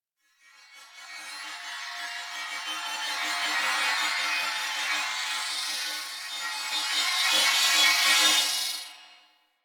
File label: Sword Buildup; Cinematics; Warframe; Orbiter Cradle